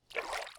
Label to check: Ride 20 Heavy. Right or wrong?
Wrong